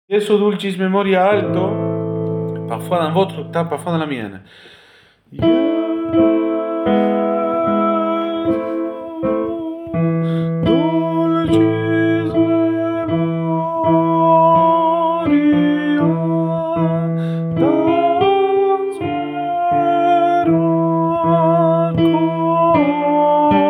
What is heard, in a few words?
VICTORIA MEMORIA ALTO; Jesu dulcis in memoria